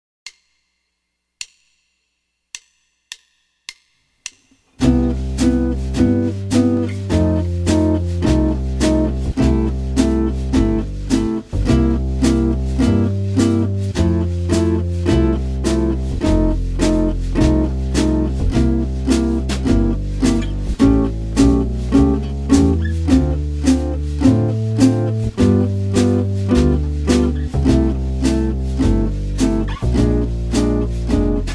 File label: Blues Jazzy, jazz guitar